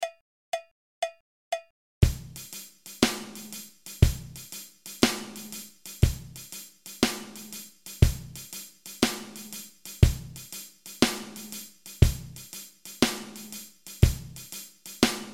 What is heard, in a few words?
half shuffle